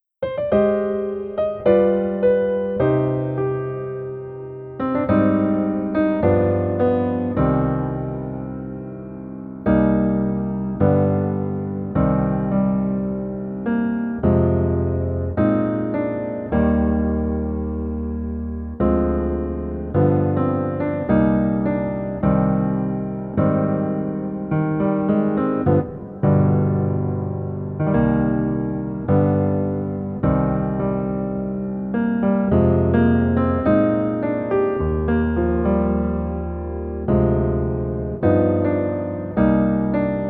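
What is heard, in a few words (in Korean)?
Piano version 남자키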